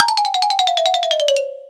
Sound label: cake release; Birthday Party Bash; Wii; Spanish; cake drop